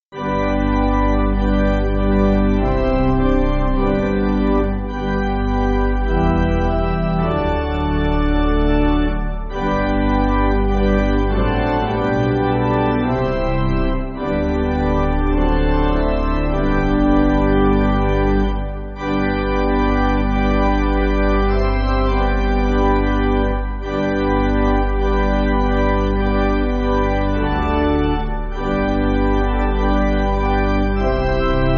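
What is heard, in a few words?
Pipe Organ, church backing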